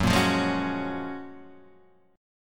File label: Gb Major 9th, down, Guitar, Half Step Down